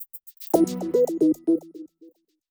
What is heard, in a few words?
SMS MMS Sound; Ucell